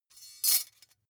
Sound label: midsize metal thin clank catch coarse